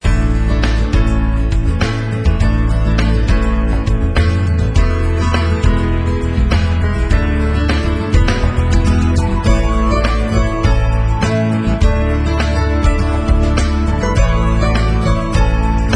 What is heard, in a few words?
BGM